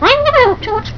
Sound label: ring bell